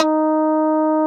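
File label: Guitar Mute G1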